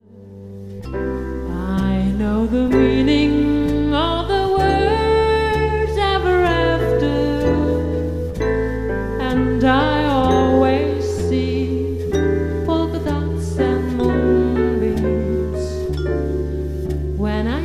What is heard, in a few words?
polka